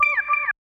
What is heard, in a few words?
piano shot; Vignette Kit